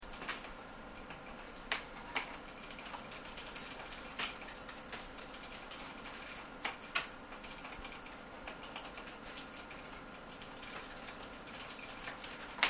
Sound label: Office Ambience; Partners In Rhyme